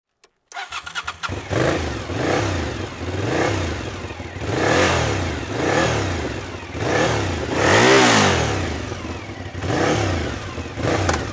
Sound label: Suzuki TL 1000 R, MC Lyde